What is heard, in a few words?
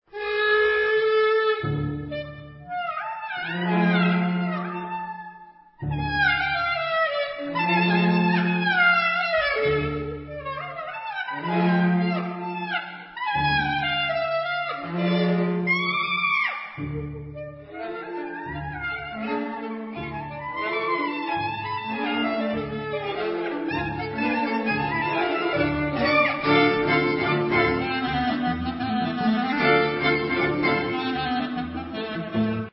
Jewish Wedding, Chamber ensembles